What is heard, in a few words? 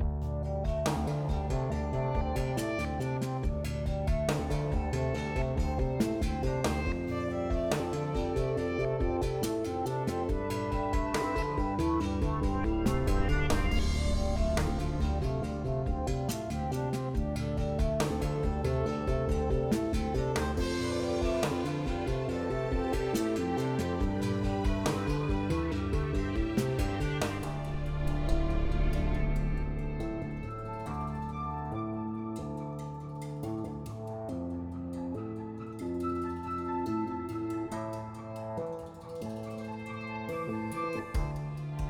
music; ludumdare32